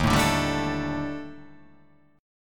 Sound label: D sharp Major 7th Flat 5th